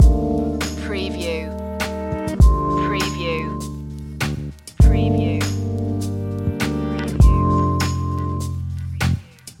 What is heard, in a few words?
chilled loop